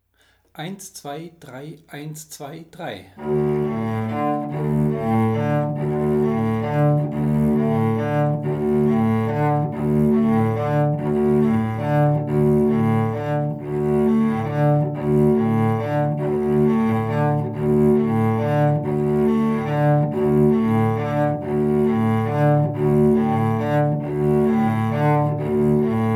Stimme D; Reimlingen; Respighi

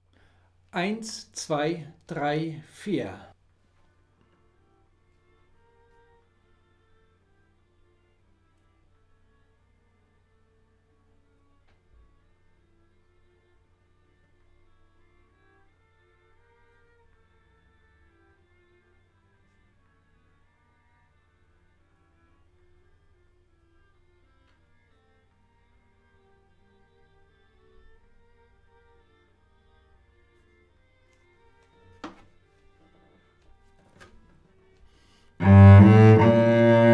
Stimme E; Reimlingen; Respighi

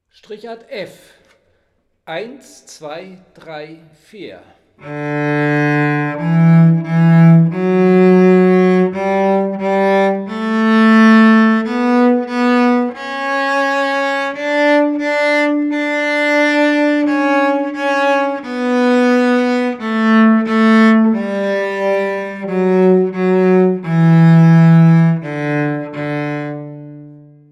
Tonleiter Strichart

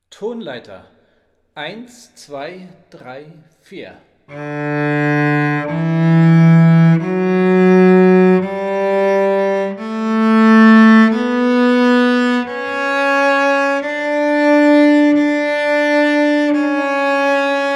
Tonleiter

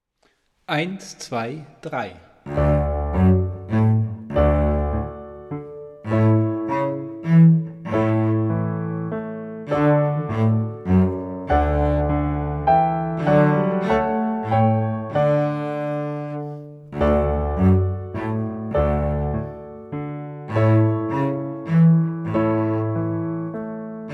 Fanfare Begleitung; Corrette

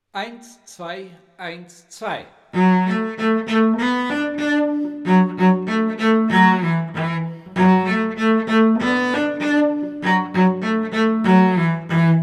Bratschenstimme A, Fletcher Sqare Dance

